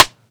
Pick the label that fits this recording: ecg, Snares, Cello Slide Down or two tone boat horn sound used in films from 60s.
Snares